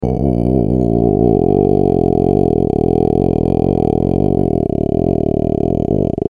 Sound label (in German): strohbass